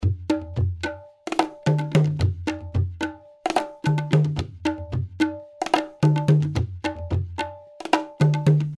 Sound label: Indian Percussions; Ghosthack Sounds; Ultimate Indian Percussions